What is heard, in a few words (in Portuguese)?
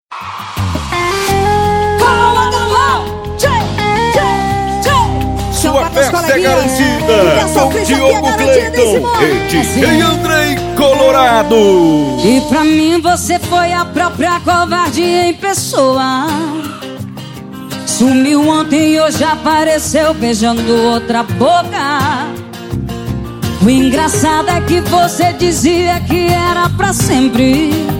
sertanejo com locutores de rodeio